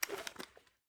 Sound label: weapon deploy; weapons